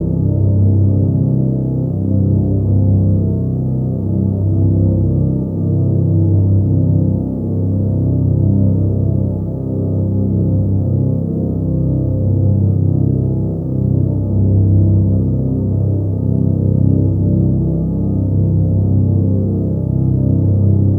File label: Drone, keys; Sonic Foundry (Sony Creative Software); James Johnson Spektral Minimalism; Sublime Keys